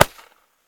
pop; World Of Goo; Fuse

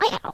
mumble; World Of Goo

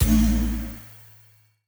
element ball charge

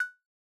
menu hover

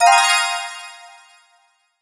quest solved

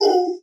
monkey hurt; boss

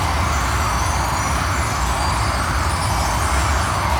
freeze breeze, battle; CrossCode

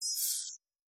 beep interaction call; The Swapper

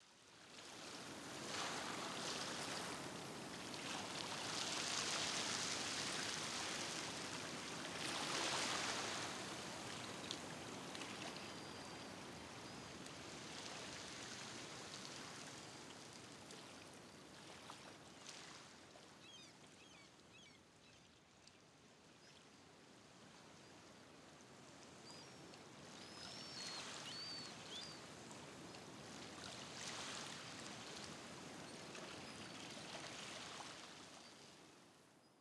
title ambient; CrossCode; background

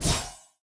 close combat cold sweep; CrossCode; battle

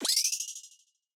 chain counter; CrossCode

